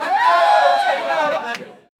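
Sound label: a group of people cheering, pub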